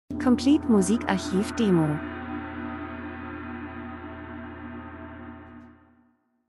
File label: drums; Gong